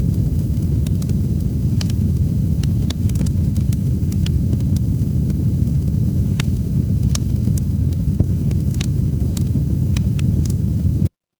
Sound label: ambience fireplace